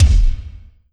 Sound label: Yamaha RM50 Rock Kick